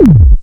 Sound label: Roland MC-202